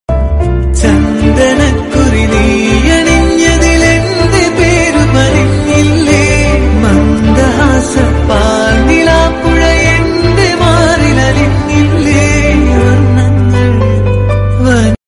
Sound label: malayalam best loving